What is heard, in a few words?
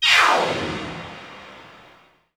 pop dive; ILIO; Trance Fusion